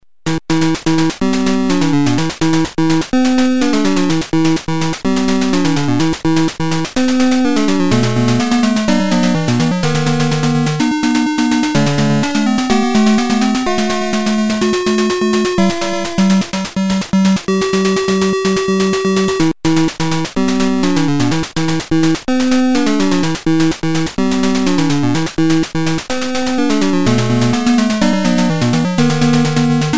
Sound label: Corridor Music